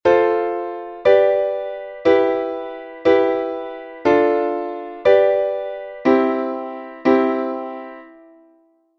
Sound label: chord